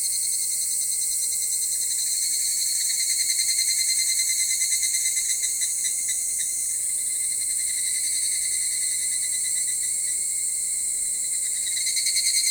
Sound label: ambience takao summer insects loop stereo